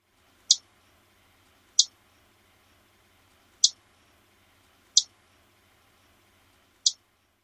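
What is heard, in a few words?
alarm chips, Prairie Warbler, Dry Tortugas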